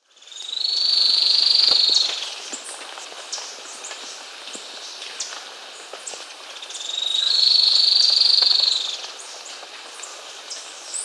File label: Rufous-tailed Xenops (Xenops milleri), Peru; S. America